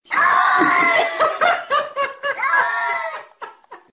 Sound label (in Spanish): gato con risa